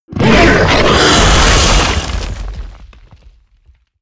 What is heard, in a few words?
ice path cast; weapons; dota; jakiro